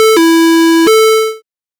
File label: achievement sound